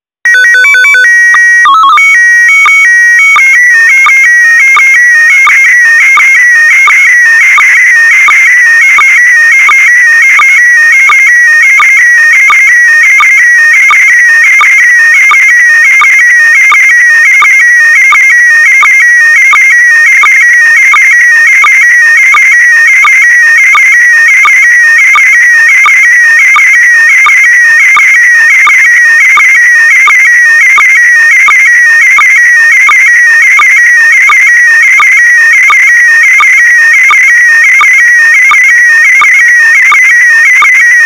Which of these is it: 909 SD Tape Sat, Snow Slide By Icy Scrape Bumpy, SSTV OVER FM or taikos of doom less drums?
SSTV OVER FM